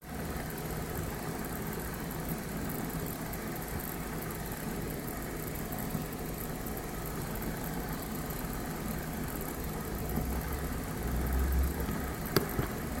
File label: lbp6240 noise